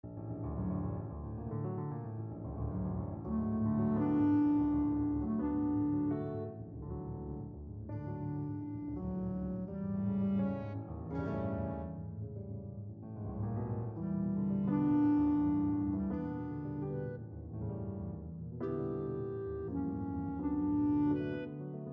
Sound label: la valse, clarinet